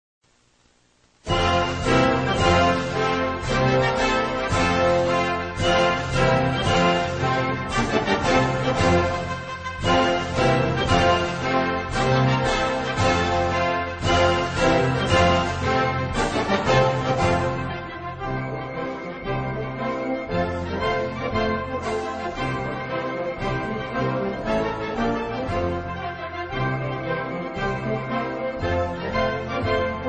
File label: Lupang Hinirang Instrumental